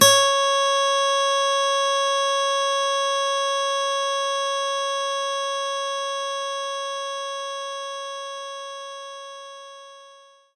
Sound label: Steel Guitar; knights try